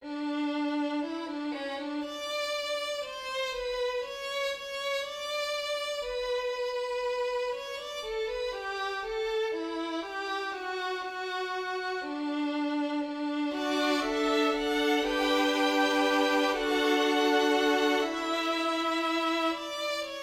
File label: Violin Sonata